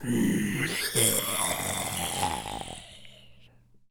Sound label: Male Taunt, Zombies; zps; Male1